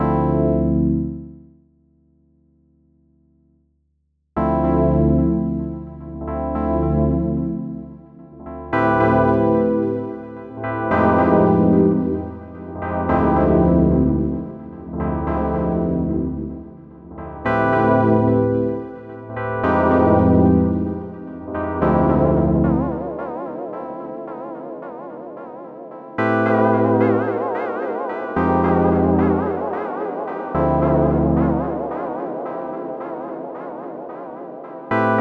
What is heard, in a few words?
Poly Synth